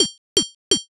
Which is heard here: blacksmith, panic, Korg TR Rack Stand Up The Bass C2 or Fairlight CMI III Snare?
blacksmith